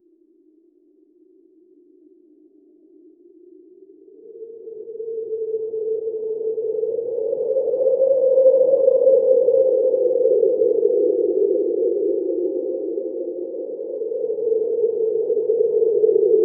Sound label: ethereal adhan in the win